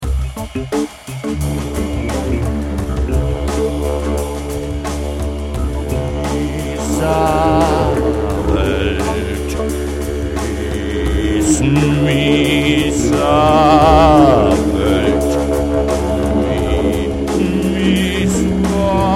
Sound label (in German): musik didgeridoo didge